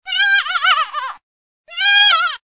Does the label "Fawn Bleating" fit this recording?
Yes